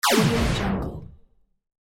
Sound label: laser woosh